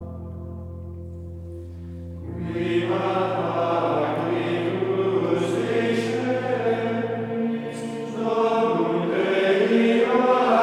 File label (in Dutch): Koor mannen Gregoriaans; Veni Creator Spiritus